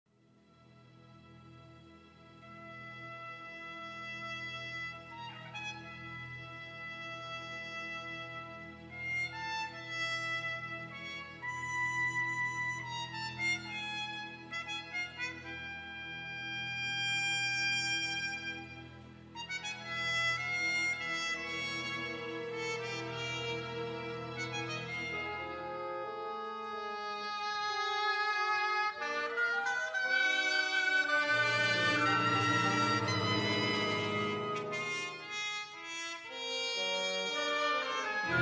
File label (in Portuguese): concerto para piano orquestra; Concerto para Piano e Orquestra (1997); Harry Crowl